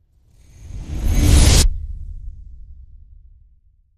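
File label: Reverse; Rev Blitz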